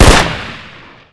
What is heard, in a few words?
shoot; sven